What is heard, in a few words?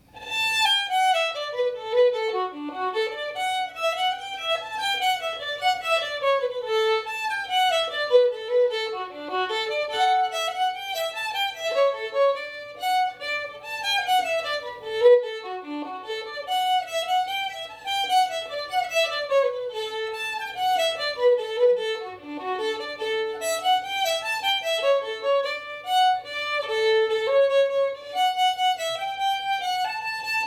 fiddle